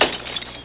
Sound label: bottle